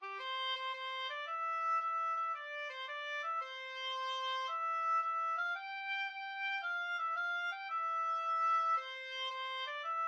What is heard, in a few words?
itsy bitsy, oboe